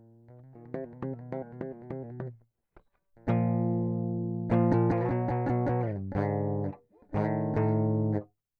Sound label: Rhythm Guitar